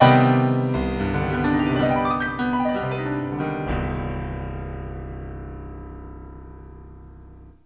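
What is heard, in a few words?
Piano, large, reverb time 0.3 seconds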